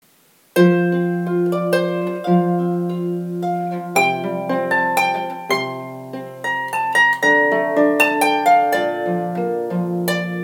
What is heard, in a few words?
Bardic